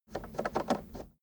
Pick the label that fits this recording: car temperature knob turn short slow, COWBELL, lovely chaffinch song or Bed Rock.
car temperature knob turn short slow